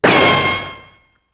cartoon shot

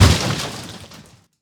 wood bang

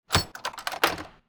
key unlock

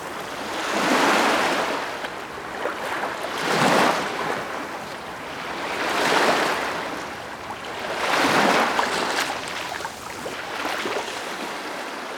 sea waves

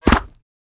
bullet body impact

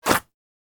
bullet hits paper

